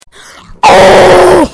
screaming earrape

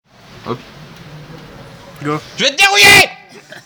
vocal

